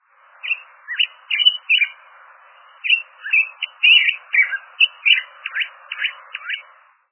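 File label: ringing-voiced chirping of hawfinch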